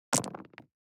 DICE on Neoprene, Throw and Roll, Standard, 6 Six Dice; Samples; ArticulatedSounds; DICE Sfx Party (Extended)